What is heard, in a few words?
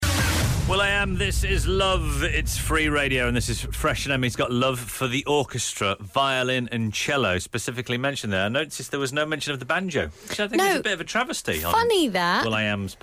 banjo